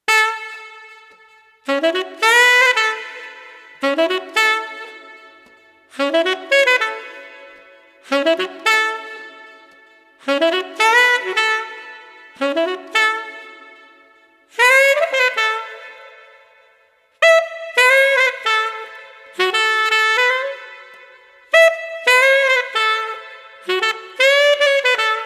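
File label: Street Sax Trumpet Playful, 112 bpm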